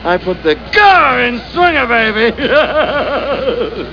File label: grrr